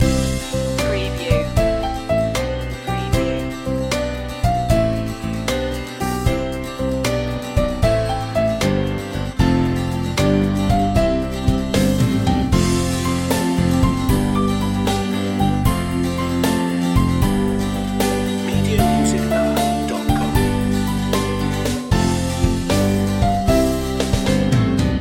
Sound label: pop goes the weasel instr loop